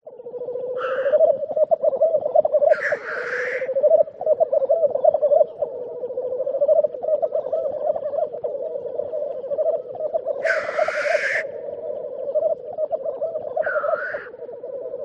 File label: Bird Black Grouse; audio hero